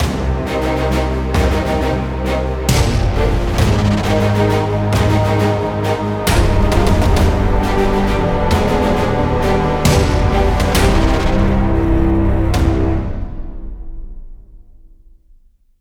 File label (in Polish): Melodia Podsumowania Lose; Tank Battle Heroes macOS Switch Windows gamerip 2021